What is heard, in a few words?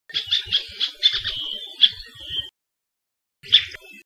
elepaio; Saddle Road Birding